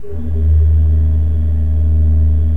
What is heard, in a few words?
KOPPELFLUTE D1, Cathedral Organ; Propeller Island